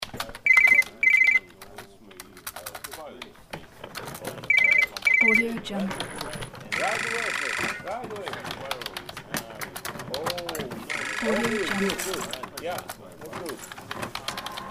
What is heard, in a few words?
busy office ambience; fxprosound